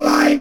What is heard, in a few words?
Synth Voc; Synth Shots; Vengeance Samples